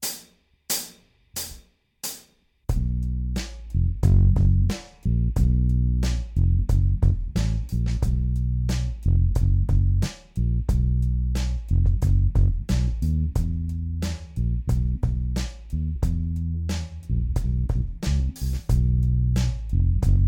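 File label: Aria Pro II PJ - jazz bass style pickup full ON & Tone full OFF; Preci-Bass-Vergleich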